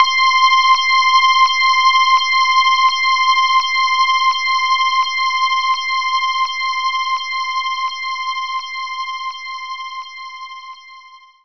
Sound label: Stacked Saw